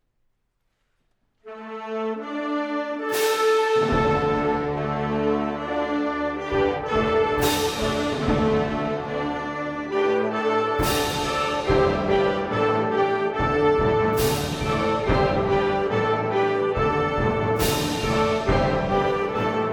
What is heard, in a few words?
Fanfare